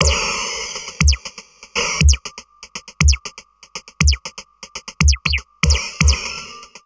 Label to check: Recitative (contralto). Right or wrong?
Wrong